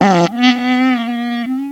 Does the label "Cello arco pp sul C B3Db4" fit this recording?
No